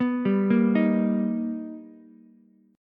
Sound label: B Augmented Major 7th B Ukulele, chord; B+M7; arpeggiated; 4,7,6,6; JGuitar